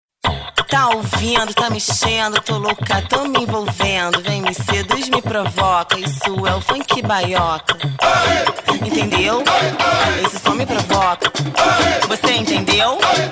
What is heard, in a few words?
Funk